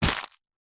plastic packet cellophane containing small object grab pick up